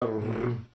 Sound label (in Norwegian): sjefen prrprprrp